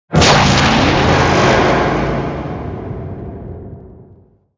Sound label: Black Hole Open; Angry Birds Space